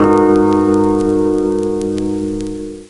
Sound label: Crack piano